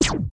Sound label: Laser; Guns; MicromanÃa 35